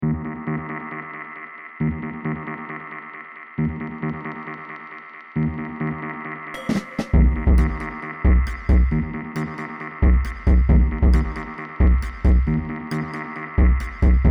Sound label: electro; electro block